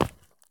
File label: sprint asphalt; Escape from Tarkov